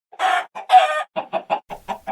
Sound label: chicken sound